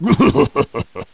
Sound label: laugh; bowser